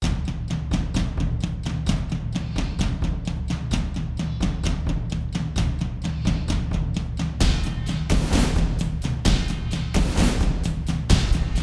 drums

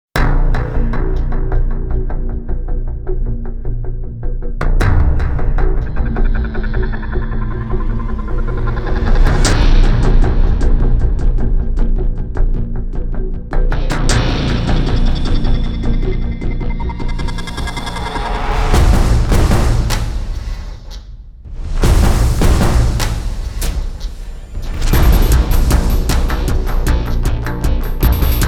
drums fx

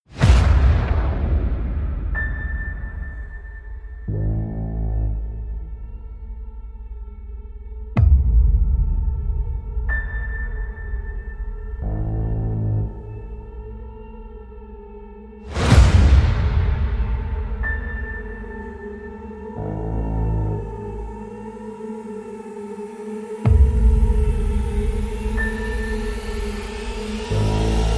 black noise

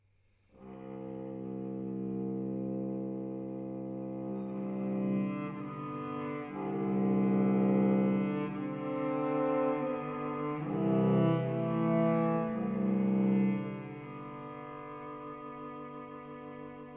suite for solo cello; benjamin britten; no 1 op 72 1964; canto secondo sostenuto

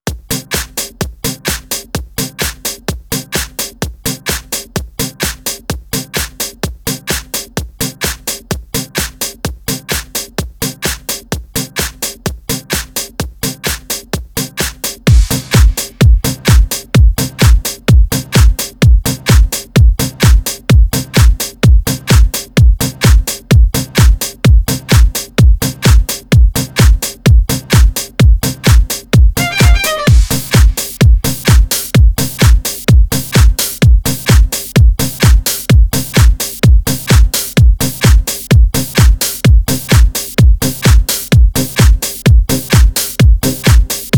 original vocal mix

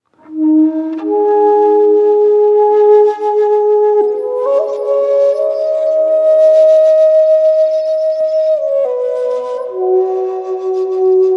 anasazi flute

